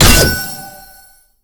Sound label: hit; Smite; god Lancelot